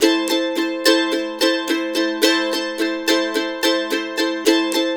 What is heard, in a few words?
RIFF, Blues Guitar Samples; ILIO - Fretworks